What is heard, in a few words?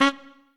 Trumpet melo